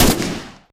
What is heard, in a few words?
g3a3 fire; STALKER